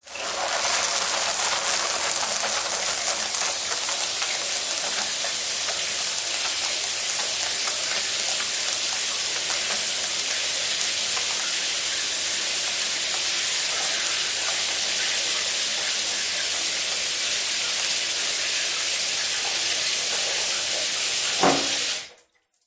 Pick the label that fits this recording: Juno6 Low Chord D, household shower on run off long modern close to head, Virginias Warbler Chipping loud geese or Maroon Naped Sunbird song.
household shower on run off long modern close to head